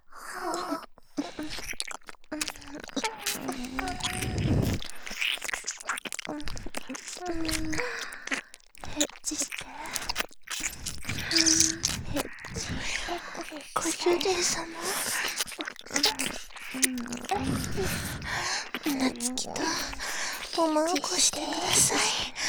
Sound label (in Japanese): 耳舐め; エッチしよ; ハーレムオナサポ 4人のメイドがご主人様のオナニーをお手伝いするバイノーラル～文、千曜、名月、莉桜～; 全員